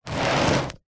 household book large old hardback slide on gritty hard surface; zapsplat